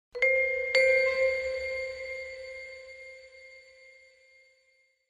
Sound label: Vibraphone Shrt Acce; mallets